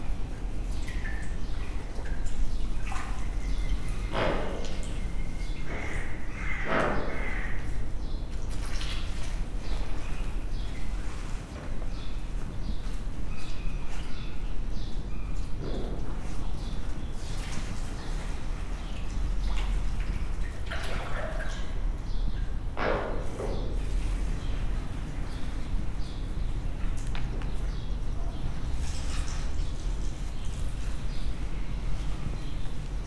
madárház, törpeflamingó; debreceni zoo 2012

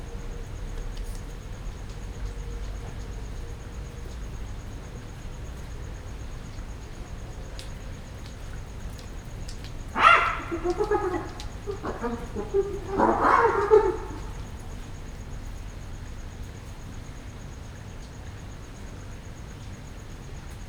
rövid hang éjjel, foltos hiéna; jaszberenyi zoo 2016

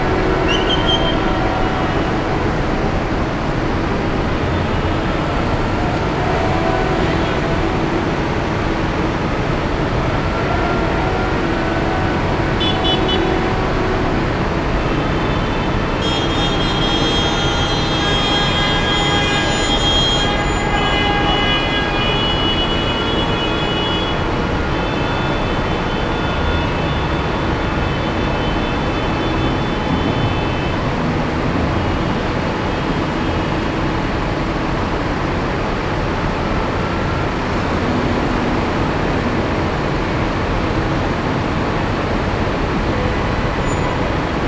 traktoros tüntetés

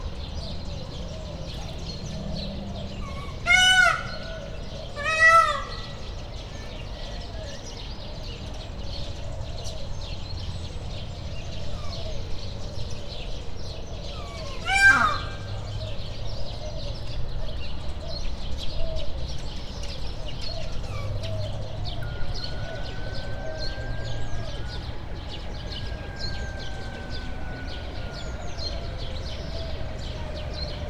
hangosan, páva; debreceni zoo 2012

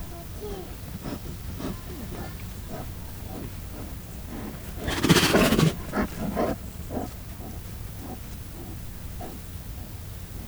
kerítésnél kettesben, bengáli tigris; miskolci zoo 2009

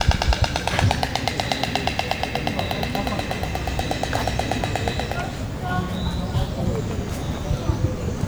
fehér gólya; szegedi zoo 2011

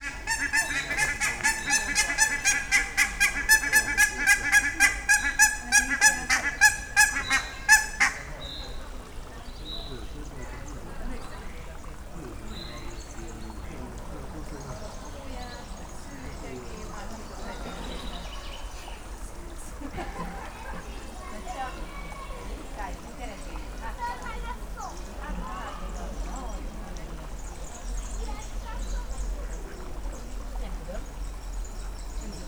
kanadai lúd; szegedi zoo 2011

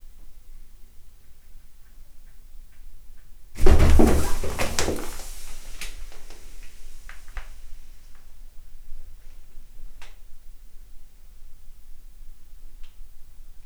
tigrispiton szájával rákap a nyúlra gyorsan rácsavarodik; állathangok; miskolci zoo 2009

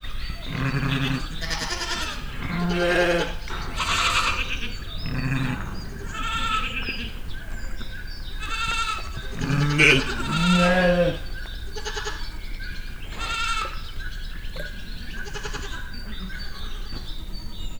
tobb szolamu korus, juh; miskolci zoo 2009

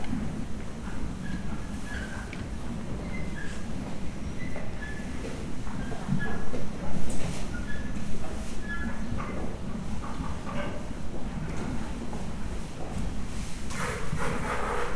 prüszkölések, ló elvezetése; Hortobágyi Nemzeti Park; máta

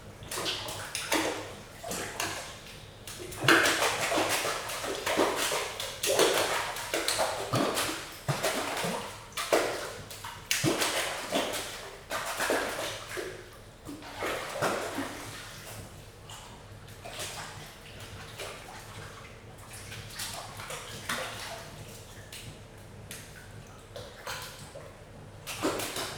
kispingvinek zárttérben úszkálnak, pápaszemes pingvin; jaszberenyi zoo 2015

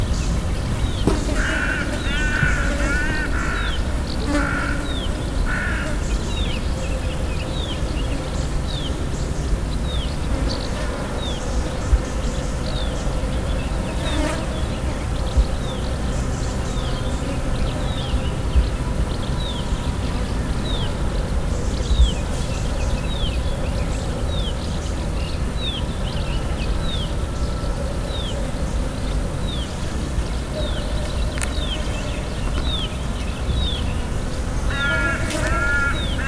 sargalabu siraly rovarok kaboca potamia

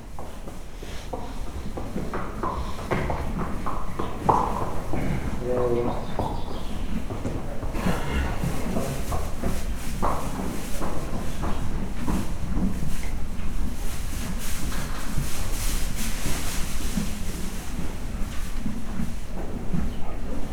ló elvezetése; Hortobágyi Nemzeti Park 2013; máta

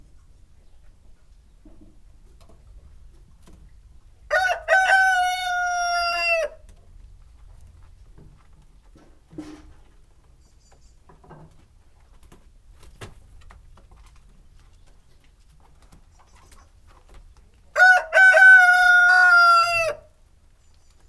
kakas reggeli baromfiudvar; parasztudvar

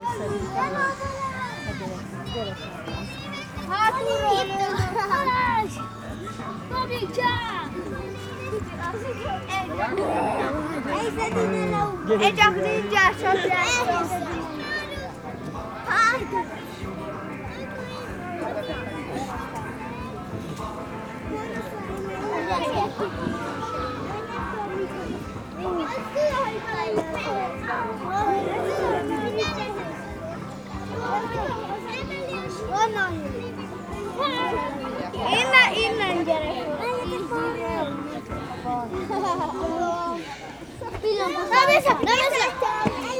hangzavar, gyermeknap gyermekszaj; szegedi zoo 2011

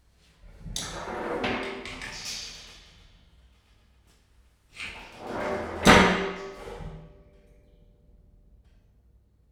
rácsozat, ketrecajtó; jaszbereny

